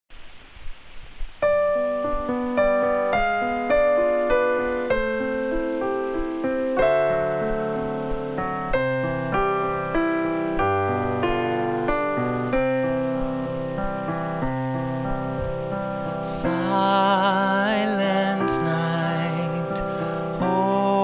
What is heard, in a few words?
Silent Night Vocal